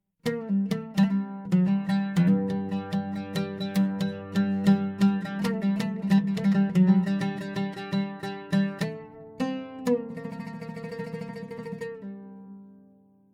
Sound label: Takht Instrumental Ensemble